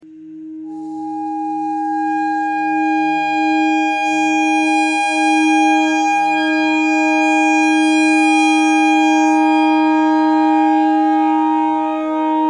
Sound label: clarinet notes e clarinet notes e thread noise